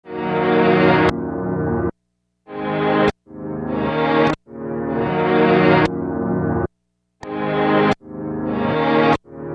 String; Strings; Sonic Foundry (Sony Creative Software); Infinite Soul VOL-1

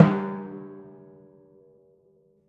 G2 f, Timpani, forte; PowerFX; Downtown Orchestra; scales

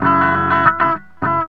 GTR; Zero-G; Funky Elements

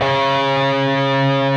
PRS D, Guitars, LEAD

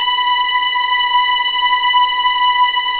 B5 LEG F, VIOLINS; East West; The Ultimate String Collection

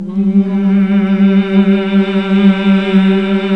Nuns Mmmhh; Sound & Vision - Gigapack II

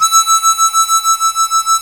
HARMONICA

